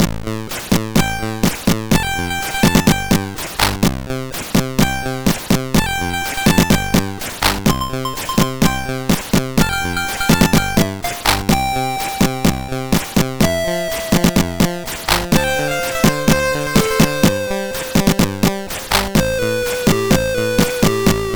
Second Demo tune, sid MOS6581R3

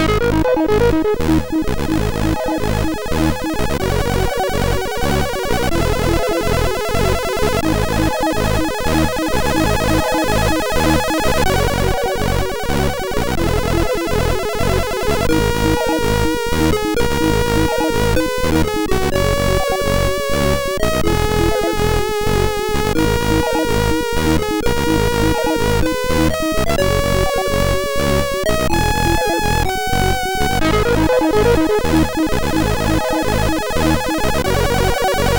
intro sid MOS6581R4; PCX-Show 2 intro

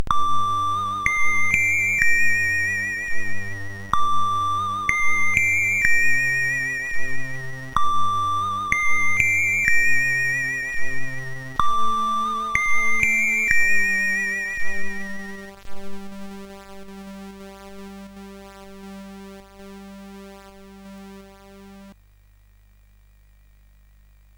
Hires Demo Kit, CSG8580R5

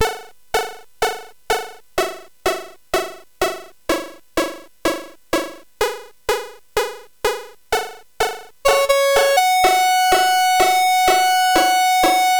sid CSG8580R5, GAMES; Continental Circus

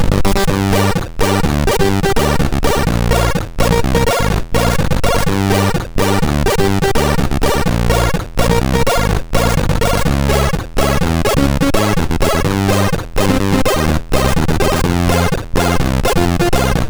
March, MOS6581R3

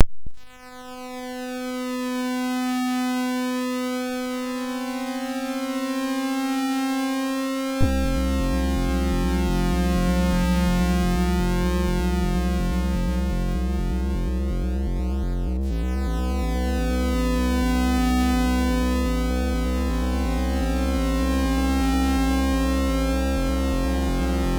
Dream Injection, MOS6581R2; sid

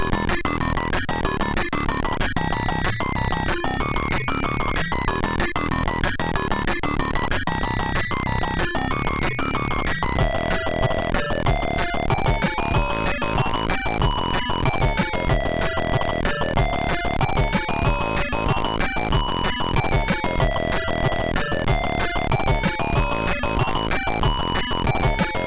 MOS6581R3 sid; Injected; Dexter

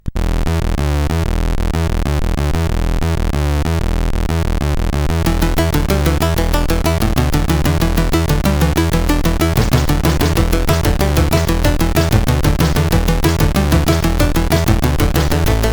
Scratchn, CSG8580R5; sid

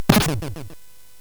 SFX; Narco Police; hvsc

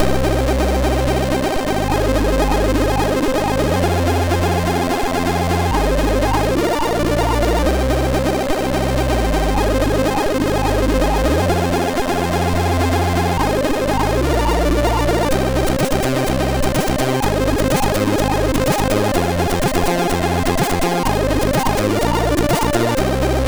Intro, sid MOS6581R3

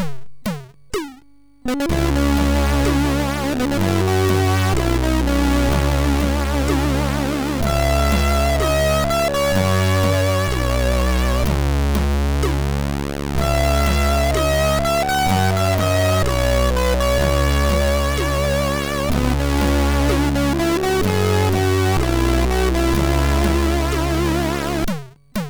Short Mix sid MOS6581R2

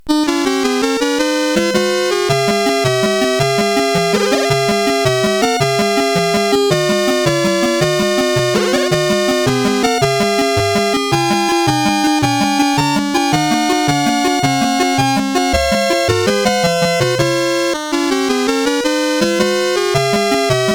Water song sid CSG8580R5; HVSC

